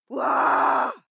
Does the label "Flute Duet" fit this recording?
No